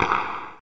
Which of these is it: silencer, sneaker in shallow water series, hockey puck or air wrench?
hockey puck